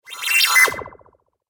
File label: Cartoon Magic Spell